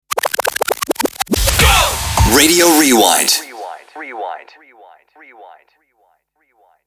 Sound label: Stinger Example Radio Rewind